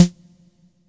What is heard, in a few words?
SNARE, DRUMS; Music Store Sound Service; Einstein